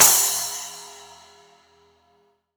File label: Cymbal Crash Sound A Key, crash cymbal sound sample tuned to the A note; cymbal drum samples